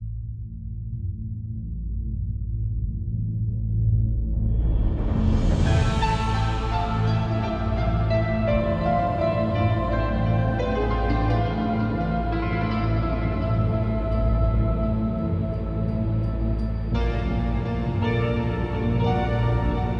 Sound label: Piano Ambience; hero of sparta; android; Atlantis